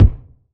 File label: verby kick single hit F sharp key, bass drum single hit tuned to the F# note; kick drum samples